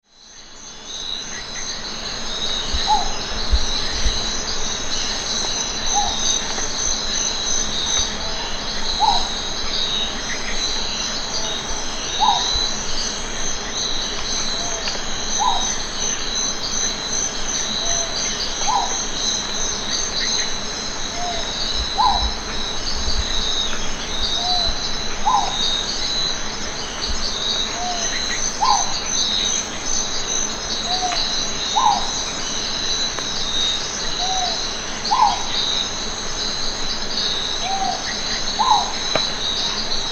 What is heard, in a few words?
philippine hawk owl